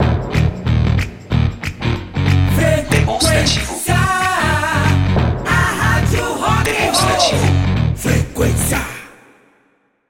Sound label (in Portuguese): A radio rock, jingles vinhetas cantadas